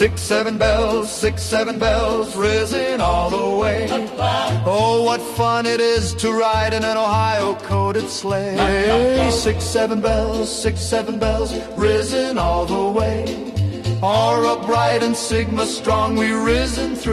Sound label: 67 Bells